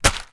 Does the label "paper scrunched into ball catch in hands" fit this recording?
Yes